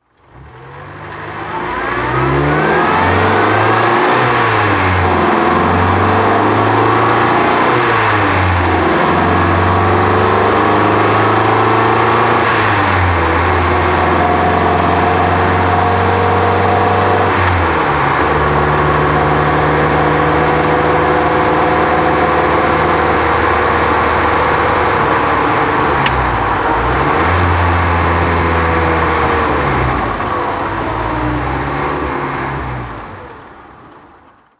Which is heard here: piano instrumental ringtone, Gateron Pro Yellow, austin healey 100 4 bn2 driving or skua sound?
austin healey 100 4 bn2 driving